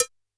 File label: Cowbell, Standard Kit, Yamaha PSR-E323